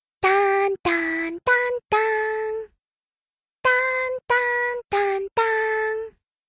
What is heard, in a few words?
Cutie; SNK